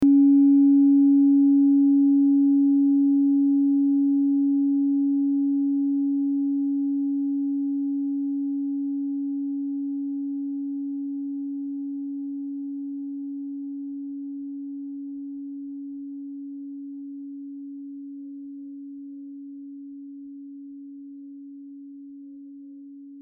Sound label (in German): klangschale set; Klangschale Nr.5; Gewicht = 660g; Durchmesser = 15,8cm; Höhe = 7,8cm